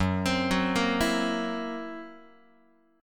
Fsharp 7th Flat 5th down Guitar; arpeggiated; JGuitar

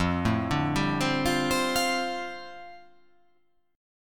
F 6th Add 9th F down, chord

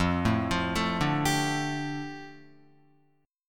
F 6th Add 9th F down Guitar, chord

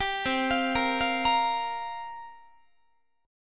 C 7th Suspended 4th, Guitar, chord; C7sus4 arpeggiated

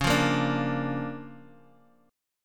D Minor Major 7th Sharp 5th, Db, guitar

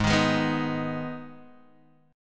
Ab Minor, Guitar, Half Step Down

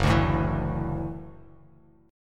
F sharp Minor 7th Sharp 5th F sharp Bass Standard; strummed; JGuitar

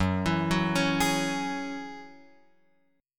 F sharp 7th Suspended 2nd Guitar Open F, chord; F#7sus2 arpeggiated

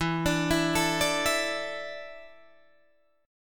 E 7th Suspended 4th, Guitar, DADGAD

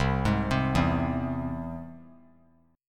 C Augmented down; arpeggiated; JGuitar

